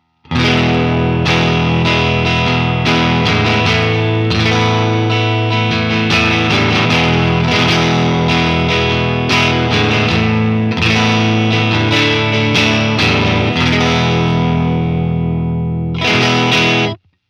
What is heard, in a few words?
us deluxe vib, helix amp model; Helixシリーズのマルチエフェクター